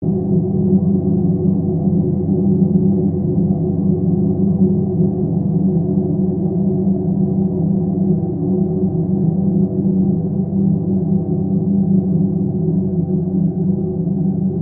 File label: Ambiance Bass Drone